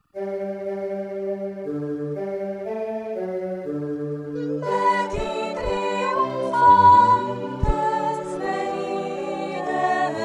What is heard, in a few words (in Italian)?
Adeste fideles - Soprani+Base; Natum de Maria Virgine